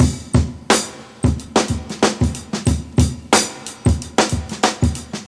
91 bpm drum groove e key, Free drum groove - kick tuned to the E note. Loudest frequency: 2046Hz